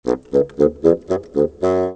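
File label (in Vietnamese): Âm thanh đang ngờ nghi ngờ vui nhộn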